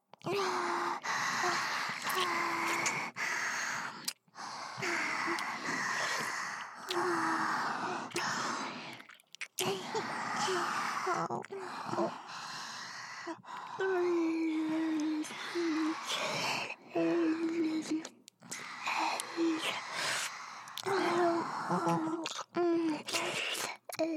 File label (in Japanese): キスだけ; 嗜癖; 集中砲火